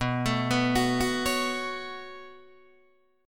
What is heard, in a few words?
B 7th Suspended 2nd, Guitar, Open D